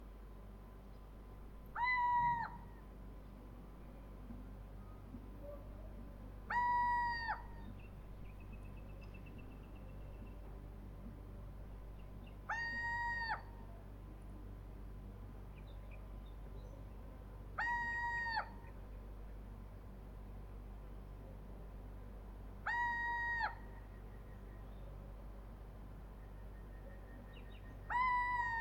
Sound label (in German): steinkauz; Stefan Wehr